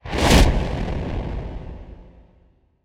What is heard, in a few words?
Ability Spirit Walk Pull Back; Lurker; Evolve; Gorgon